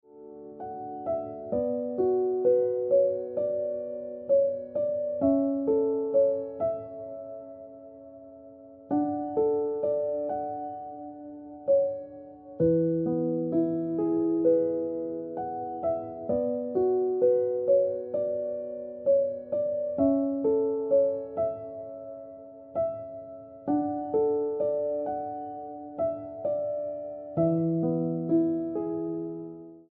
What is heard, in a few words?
Ringtone Piano Music